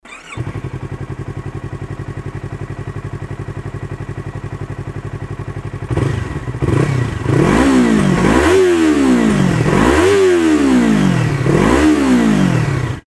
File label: yzf-r25 akrapovic